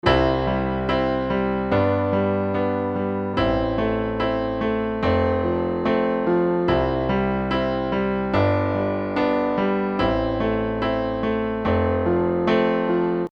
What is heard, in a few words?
piano, stereo